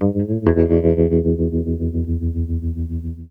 COUNTRY20; USB Soundscan vol.31; Miro s Grooves; AKAI

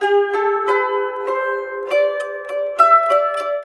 SITUR PH; Spectrasonics; Heart of Asia

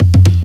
TOM FILL; Ragga & Dub Reggae; TOMS KIT; USB Soundscan vol.27; AKAI

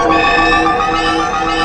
ELEC; East West - Global Sounds; SPATIAL

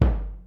kick, Millenium MPS-400

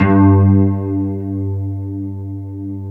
PULS HARP G1; Big Fish Audio; Synth City

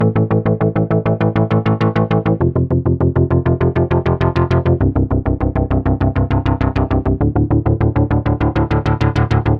A Bass Fifth Sequence, 100; Zero-G; Future Wave

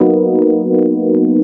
tuners

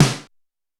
Roland U220 Dry Set; drum machine; 90 s Sample CDs; 300 Drum Machines

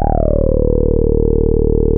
TAURUS E2, synthesizer

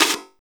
PERC, Drum & Bass, 170 BPM; Ueberschall; Drum & Bass Flash Trackz

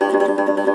cumbus saz G, plucked strings; E-MU Producer Series Vol. 7 – Old World Instruments

